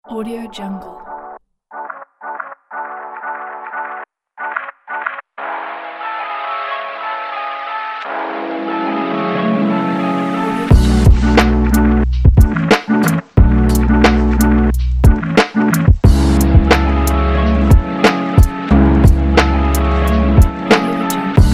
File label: vlog lofi